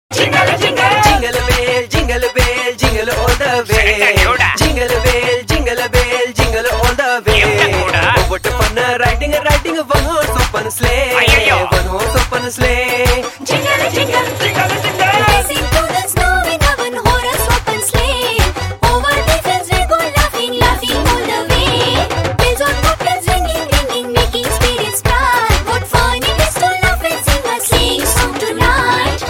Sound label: jingle bell gujurati tamil